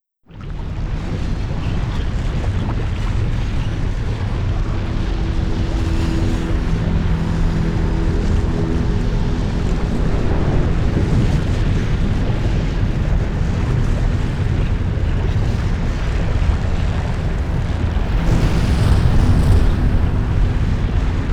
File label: Volcano Ambience; Monster Hunter; Area 4, 5 - Extended